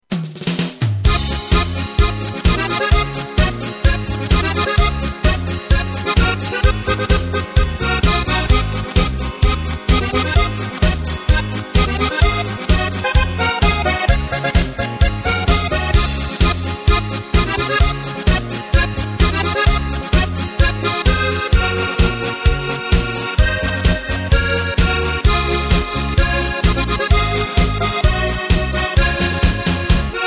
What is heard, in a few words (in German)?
Marsch